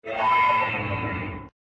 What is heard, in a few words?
Earth Spirit Die